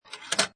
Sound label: Pinball Sound Roll And Pass Metal Gate; Video Games; Lynne Music